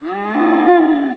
cow, bovines; animals; mammals; stamps

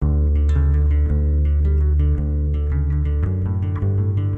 smooth; tuxpaint; magic

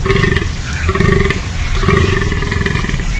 deer; animals; mammals; stamps

